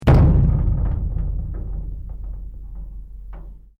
METAL IMPACT ECHOE; Metal Sound Effects; Metal (Sound Effects:Materials)

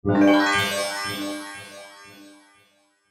Sci Fi Magical, Sci Fi Magical Sparkle Sci-Fi; Blastwave

